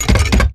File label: Metal Jog; Titanfall 2